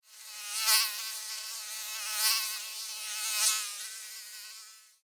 Mosquito Fly By, Animal, Mosquito, Fly, By, Wings, Insect, Bug; Circle; Mosquitoe; Mosquitoes